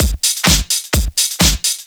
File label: Straight Loop 128 BPM, House